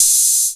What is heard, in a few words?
OHH, Roland JX-305